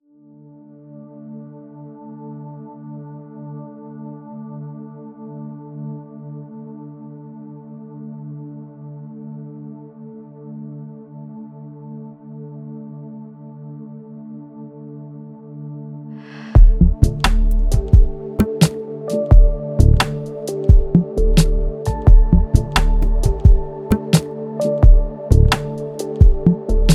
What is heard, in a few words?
tabla